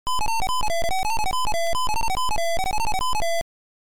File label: Title Beeper; Skool Daze